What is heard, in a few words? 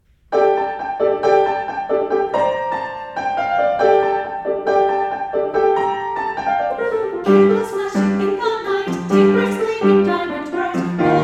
Unison